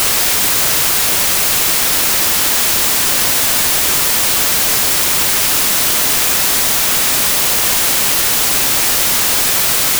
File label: White